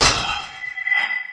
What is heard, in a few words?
crazy lady pain; cof; running crazy